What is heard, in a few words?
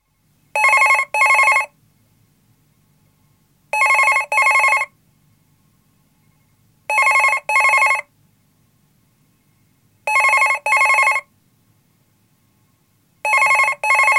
telephones; bbc